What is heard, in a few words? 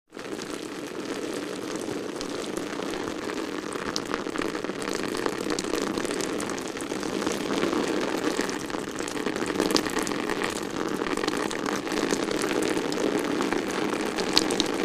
Rain On Raincoat Or Um, raindrops, splatty